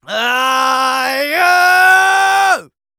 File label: Yells, Viking Vocal